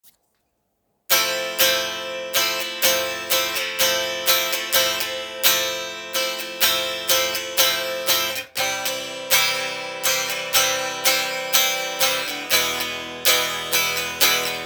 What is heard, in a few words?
Fmaj7 C E Am G F